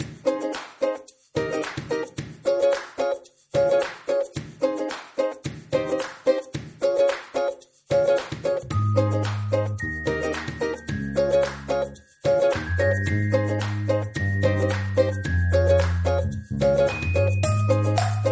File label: music cheerful; bgm